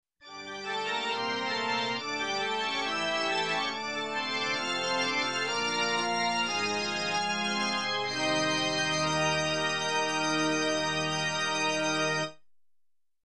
Major Organ Progession

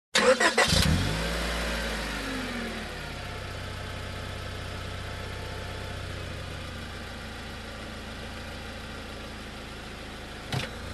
Car engine ignition and drive away

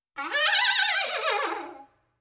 Funny imitation of horse neigh

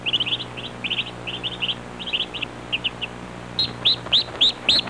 Miscellaneous bird chirps soft

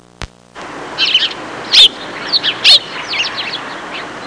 Bluebirds chirping ambience

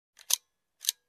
Manual Camera Shutter Click

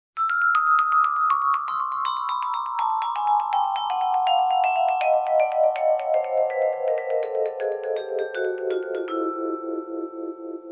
Icy vibraphone descending melody extended

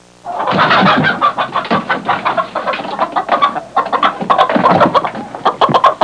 Hens flapping and clucking

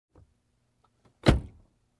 Closing car door softly

